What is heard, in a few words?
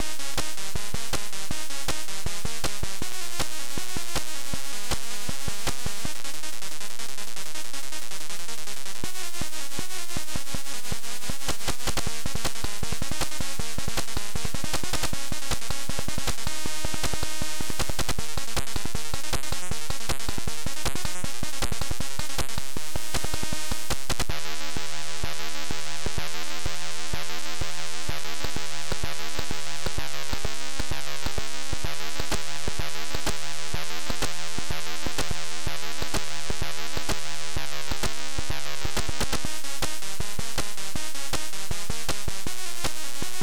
3 Channels; TS 2068 Demo